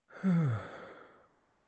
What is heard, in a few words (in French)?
Soupir; Index des soupirs; Hadjithomas Joreige